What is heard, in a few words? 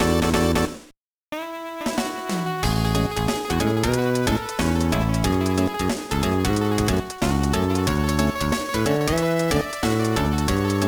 BGM; Super Real Mahjong Dousoukai 2004 GBA